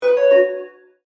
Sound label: Multimedia Chime, Technology, Multimedia, Chime